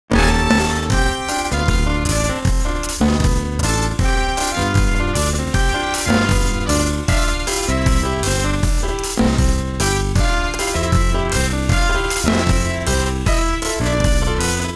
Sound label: Jazz, WSOLA; TSMtoolbox